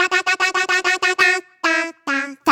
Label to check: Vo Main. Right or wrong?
Right